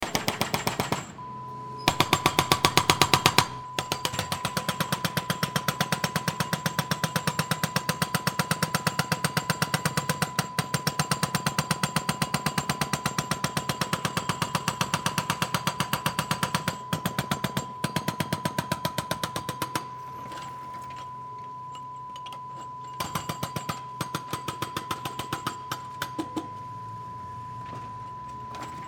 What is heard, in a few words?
Roadworks Ext